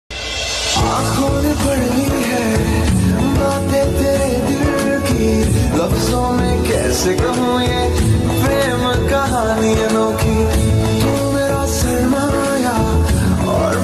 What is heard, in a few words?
hindi ringtone